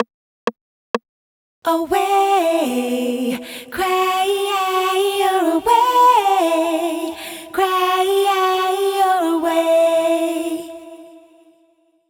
Chorus dubbed FX, 127BPM; Vengeance Samples

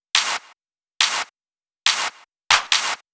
Female Clap Mix, 140 BPM; Vengeance Samples; Vocal-Hook Kits; Song Kit

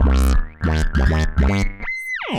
Roland F Line, Roland S100; musicradar-synth-samples

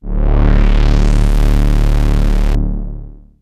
Roland D D1; Roland S100; musicradar-synth-samples

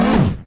Synth Sound; Vengeance Samples; VEE Synth Shots

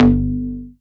Synth Cuts; Vengeance Samples; VES1 Instruments

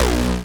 Synths B; vengeance essential club sounds-3

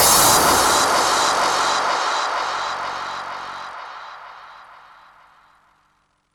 Downsweeps

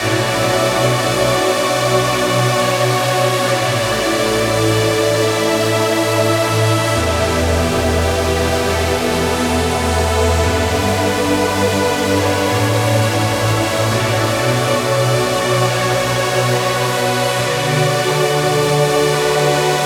138 BPM Huge Pad, B minor; Melody & Synth

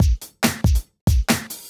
Loops BB 140, BreakBeats; Vengeance Samples

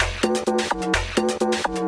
VFX2 Loop 128 BPM

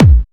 Bassdrums UK Trance Kicks; Vengeance Samples

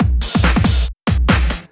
Breakbeat 140 BPM

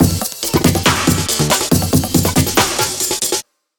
Drumloop; Ghosthack

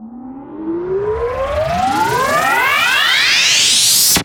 Uplifter

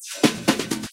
Fill Ins 128BPM; Vengeance Samples

